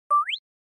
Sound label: Anime Motion High